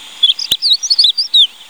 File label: bunting, birds